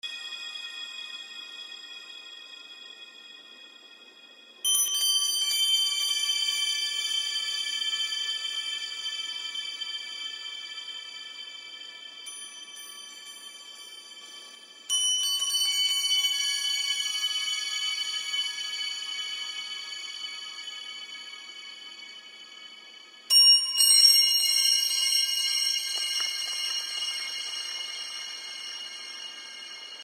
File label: atmo scifi crystals falling into infinity